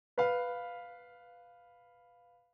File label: dominant motion